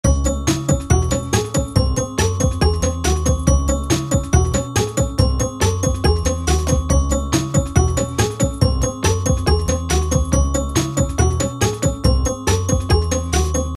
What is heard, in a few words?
level music speed